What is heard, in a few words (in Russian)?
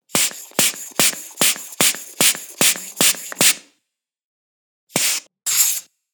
zvuk ruchnogo nasosa vozduha